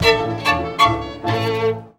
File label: VIOLIN HITS; Best Service; World Colours